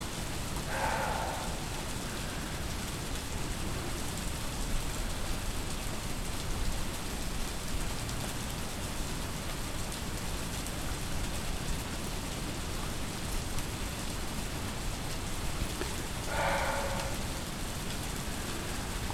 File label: muntjac gongshan Muntiacus gongshanensis